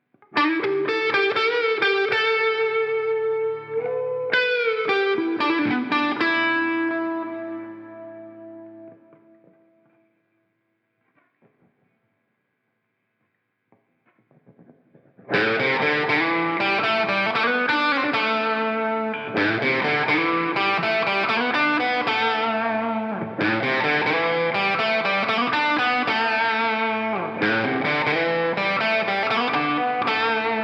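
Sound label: AM-C-G Mesa Triple Rectifier; ik multimedia amplitube 4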